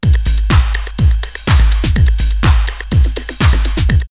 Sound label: Tekno X-P 124, drumloops Rave; X-Static Goldmine; 90 s Sample CDs